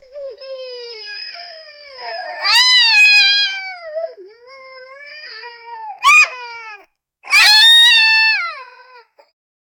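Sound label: little child crying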